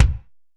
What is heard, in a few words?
BD, DRUMS; East West; Global Sounds; RY30